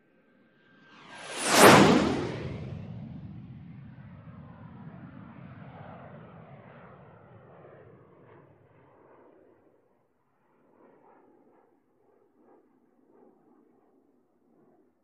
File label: Gnat: By Low Over Canyon; Low Rumble Of Approach